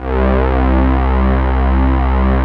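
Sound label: Juno brass, analog synths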